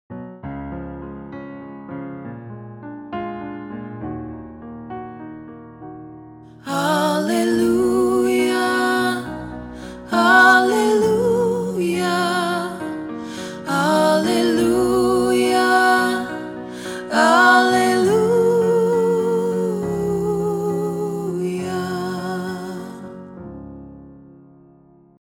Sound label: Alto Practice Track